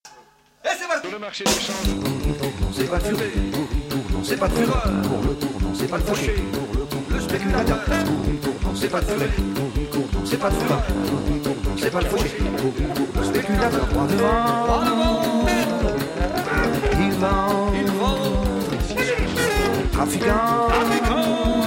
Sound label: canto